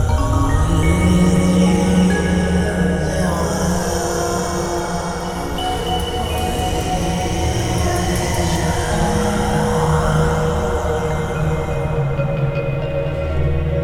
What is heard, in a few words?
Synvoxperc Scape